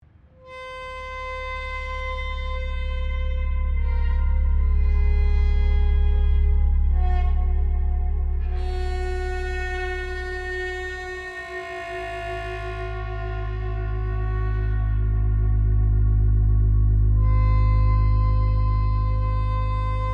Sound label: Gate Drones